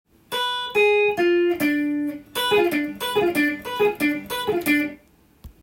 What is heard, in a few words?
dim picking, sweep picking with diminished chords, guitar